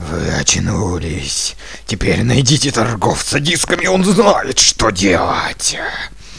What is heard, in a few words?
folk